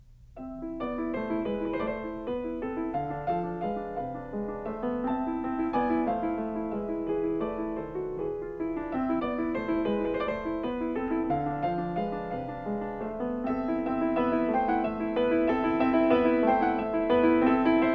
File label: Song Without Words for piano in A minor